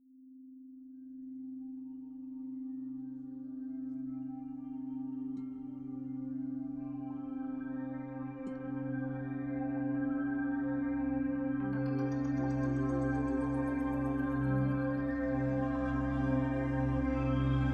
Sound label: cry bowl